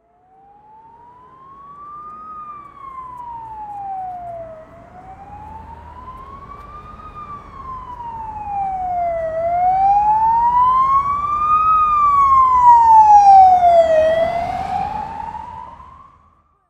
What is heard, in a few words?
Ambulance sound effect